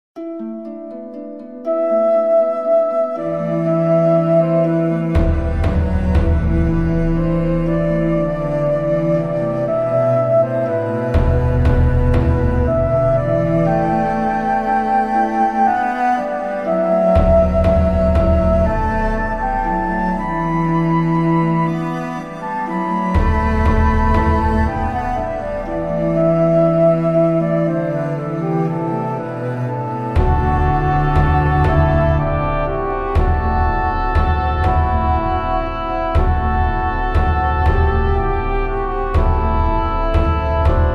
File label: Peaceful Country; Joan of Arc